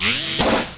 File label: death witch attack